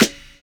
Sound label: SNAP; Univers Sons - Kitch 70s